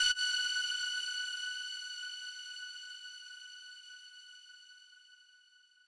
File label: lead